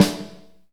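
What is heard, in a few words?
SNR snare, Medium Rock; Northstar; Drumscapes Roland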